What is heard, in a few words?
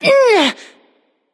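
grunt hit, female; smite; voicepack; hera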